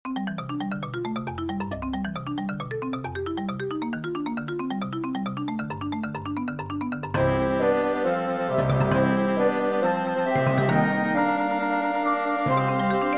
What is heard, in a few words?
marimba; Marimba Concerto, Mvt. 1